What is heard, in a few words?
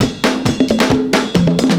PERCS, 70 s Breakbeats